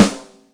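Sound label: Vintage Brass, Yamaha DTXpress Acoustic Snare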